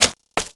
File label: get firecracker